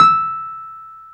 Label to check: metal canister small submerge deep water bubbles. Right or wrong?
Wrong